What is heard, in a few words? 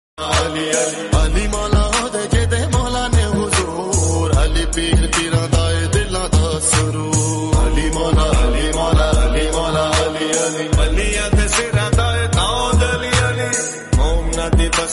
Arabic Song